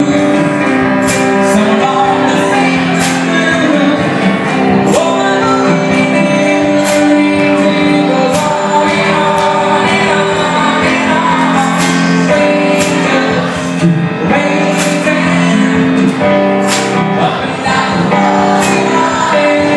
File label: drunk people singing